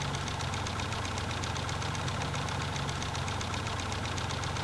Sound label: slash idle, knife